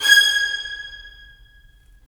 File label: Violins; Roland - LA Composer vol. 4